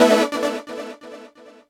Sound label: KEY SHOT TEKNO 140 A; Techno2; Singleshots